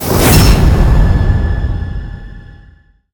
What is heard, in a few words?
stab sound; dark souls